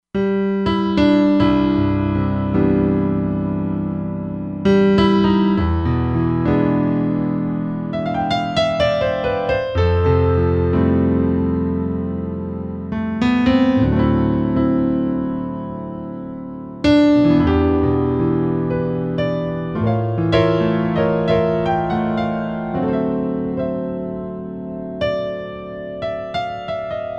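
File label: Piano Natural Grand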